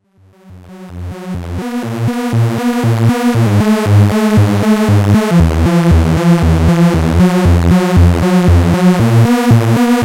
Behringer Model D leads; Kompakter Desktop Synthesizer

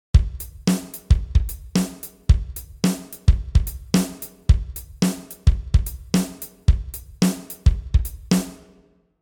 Yamaha CP40 stage basic drumkit

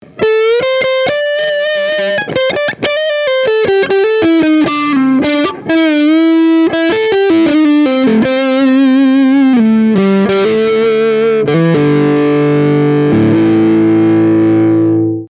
lead neck coil-split, epiphone sg g-400 pro

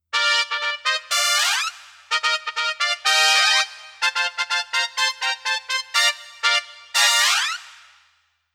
brass velo glissando; Casio PX-S3100

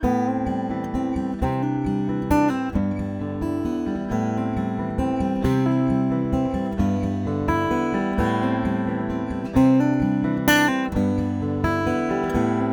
fame looper picking bypass

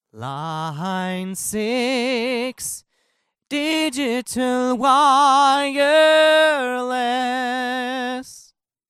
e835 line6; Line 6 XD-V70

